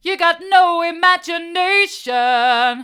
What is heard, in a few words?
you've got no imagination hard, vocal